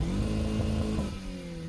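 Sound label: engine high hit; Blind Drive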